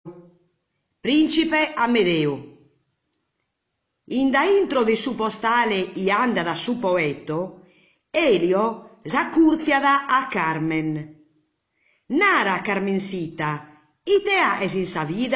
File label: Sardinian Orgosolo; Giga Sardinian